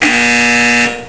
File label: BUZZER